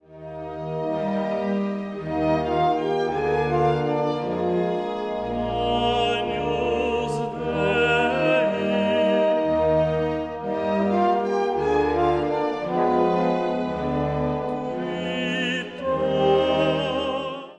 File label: Agnus Dei Tenor trombones